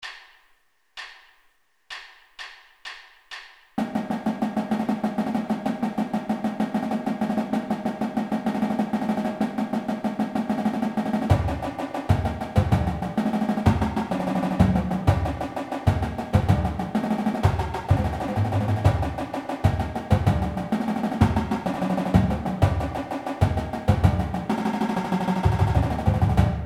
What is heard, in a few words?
trippy medium, marching drum music warm ups